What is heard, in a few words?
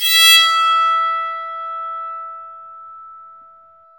BOWED PSA, Bowed Psaltry